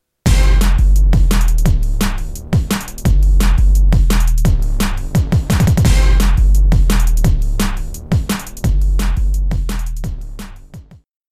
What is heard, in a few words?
bass; dbass